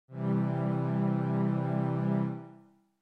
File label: C Major